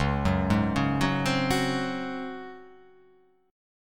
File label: C sharp Major 7th Suspended 4th C sharp